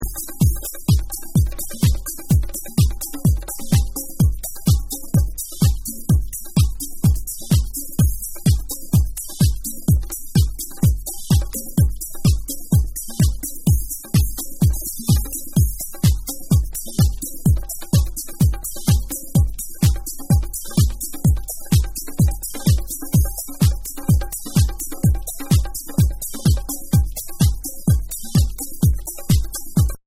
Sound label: Drum Mix